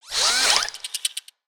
Broombot Whirl, creatures mechanical; Inventor Broombot

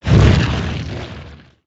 Earth Swing; Spinjitsu

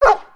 dog bark

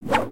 Torch Spin; build mode

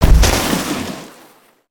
Snowman Smash; destructibles

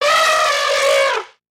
Pets Elephant Happy; creatures pets; Lego

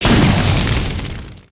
Impact

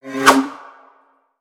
Sword; Lego; Strombie; creatures organic

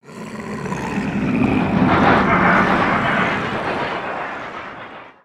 dark jungle oneshot

